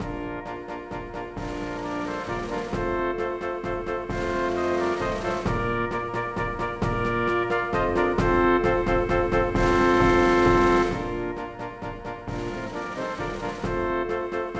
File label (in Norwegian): For messingkvintett ad.lib. trommer